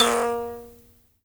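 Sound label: high buzz kalimba A#3; HIBZ KALI; Heart of Africa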